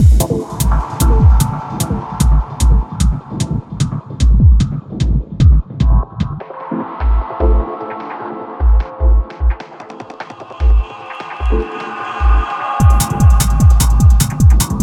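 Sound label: 150BPM Fmin, groove techno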